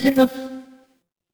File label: ui menu